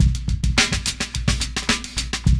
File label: LOOP; E-Lab; X Static Goldmine 2; akai